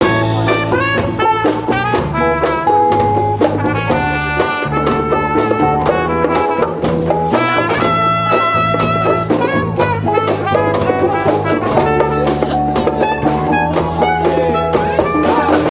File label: instrumental